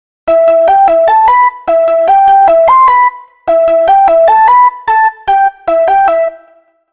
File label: High alert, phonetones; Sony Ericsson; P5i Paris Prototype